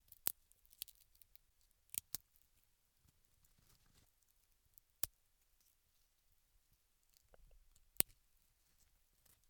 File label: Twig Breaking Snapped And Crushed